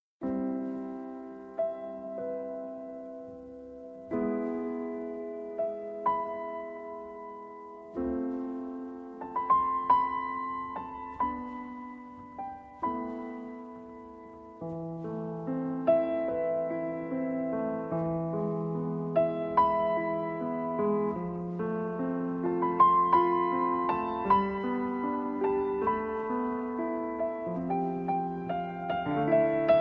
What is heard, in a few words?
LULLABY; RENESMEE